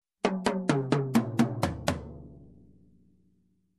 Slow Tom Fill

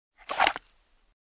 Pulling out gun from holster

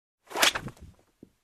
Snapback sound

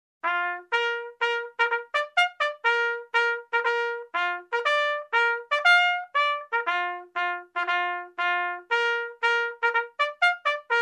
Trumpet fanfare

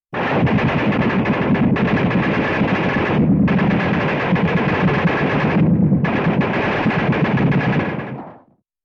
Gatling Gun

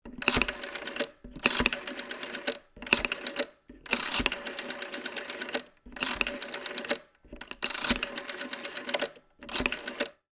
Rotary phone dialing

